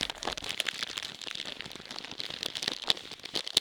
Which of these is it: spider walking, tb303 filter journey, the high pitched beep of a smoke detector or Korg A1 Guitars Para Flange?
spider walking